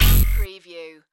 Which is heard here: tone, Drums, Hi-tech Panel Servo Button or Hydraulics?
Hi-tech Panel Servo Button